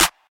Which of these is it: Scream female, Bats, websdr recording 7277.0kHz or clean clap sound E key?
clean clap sound E key